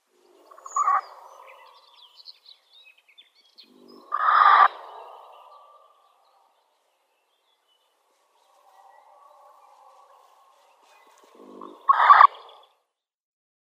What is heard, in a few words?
Corn Crake calls